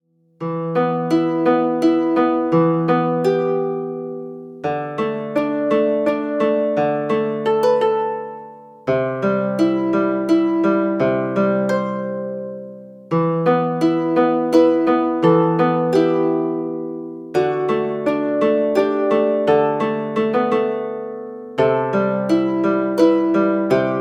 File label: Lute Song